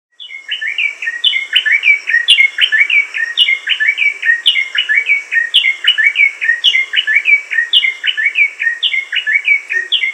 suara cinenen merah

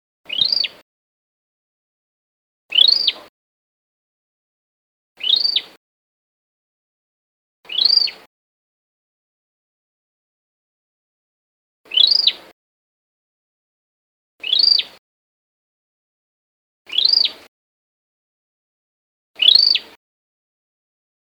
suara burung perenjak kamboja alarm call

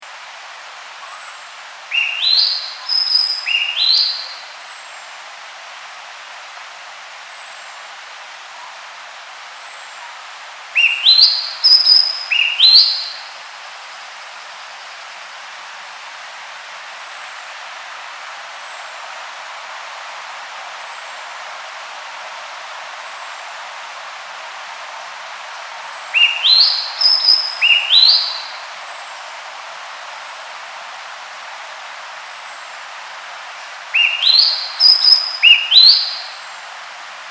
suara burung cissa thalassina ekek keling

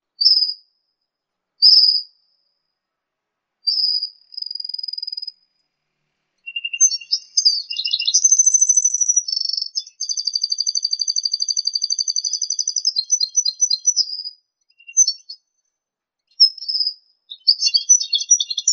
hibrid red siskin x kenari

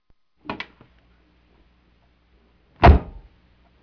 suara mobil

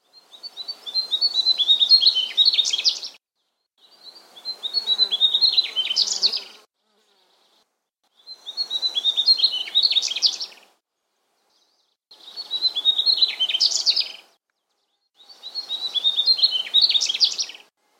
suara sikatan gunung song

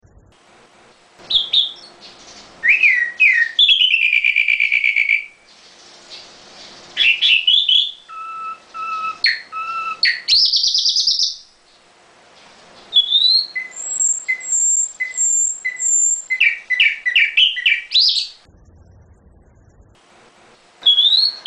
kicauan nightingale variasi

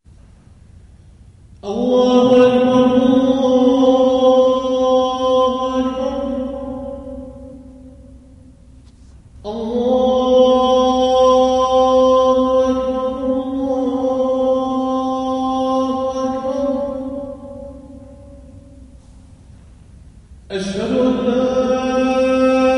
adzan uea

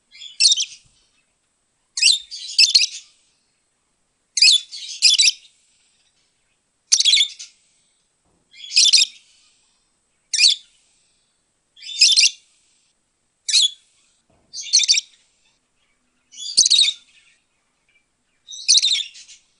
suara call lovebird

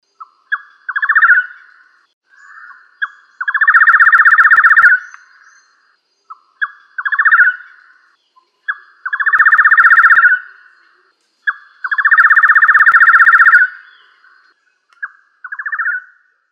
suara takur tengkuk emas

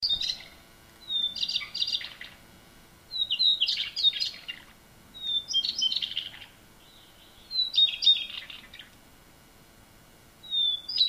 suara panggilan cingcoang biru

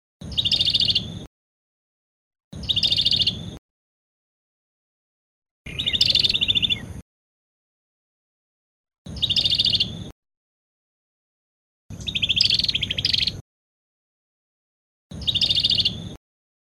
suara burung perenjak kamboja

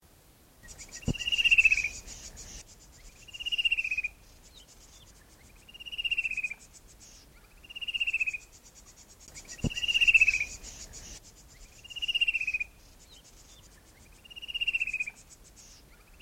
suara wiwik kipas

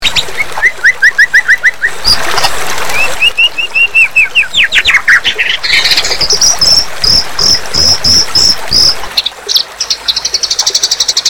murai batu isi jangkrik gereja

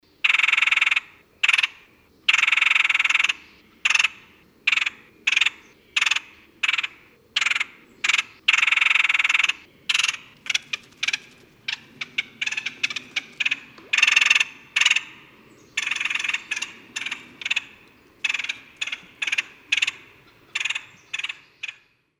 suara pelatuk palamerah

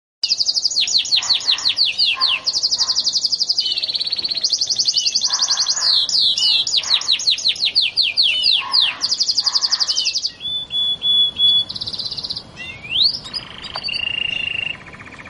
kenari gloster

